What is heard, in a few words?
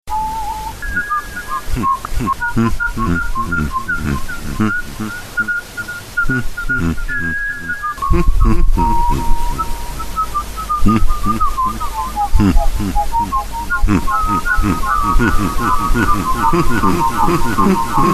hmphmp